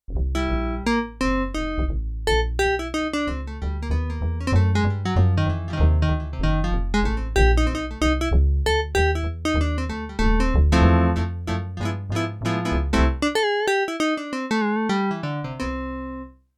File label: JAZZ GUITAR BASS; Yamaha TX16W; Jazz Performance